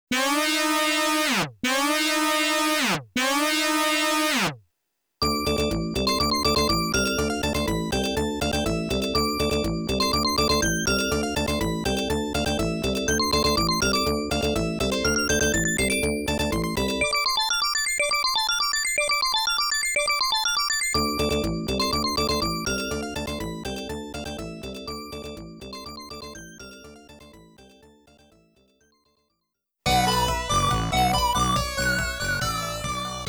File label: WARNING BOSS CLEAR; RAINBOW ISLANDS; DARIUS